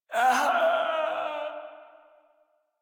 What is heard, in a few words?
Death, Barks, Battle; Warframe; Baro Defense